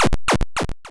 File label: power down